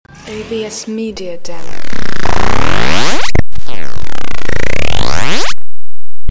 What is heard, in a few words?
Msg Alert